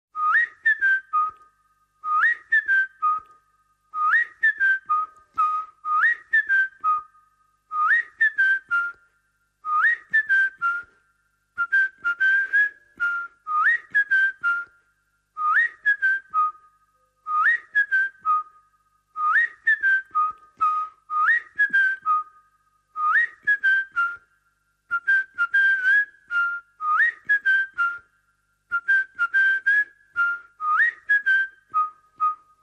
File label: Whistle Only